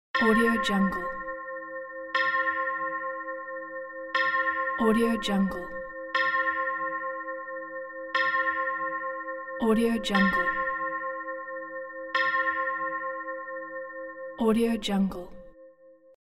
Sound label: sound of the striking clock